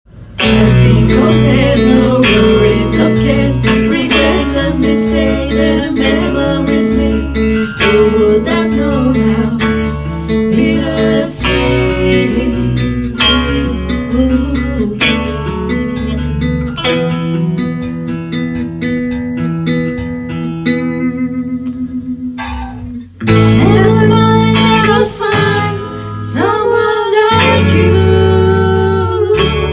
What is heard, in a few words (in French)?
Travail Chant